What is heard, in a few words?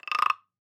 Guiro Wooden Frog; World Percussion; Cymatics; Emperor World Percussion